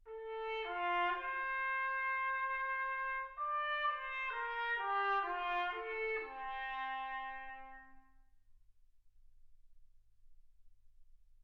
trumpet

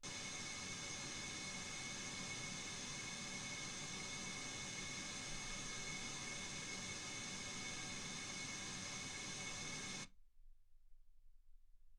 wgn 48kHz

